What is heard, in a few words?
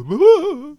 Healer Attack Vocals